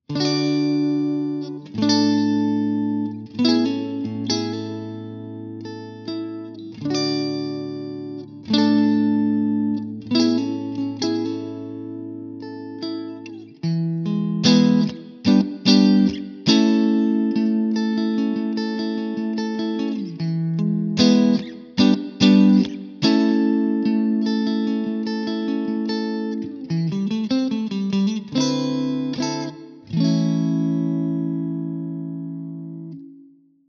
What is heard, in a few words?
clean; jensen tone